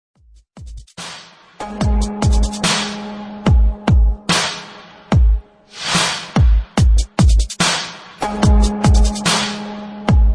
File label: dubstep, ringtones; optimus prime was right